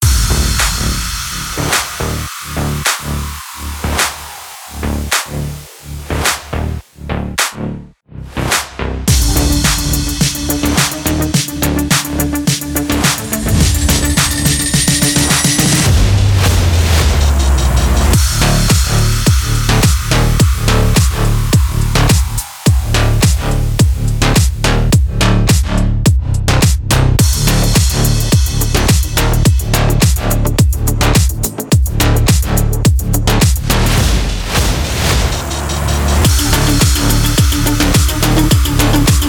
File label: cyberpunk background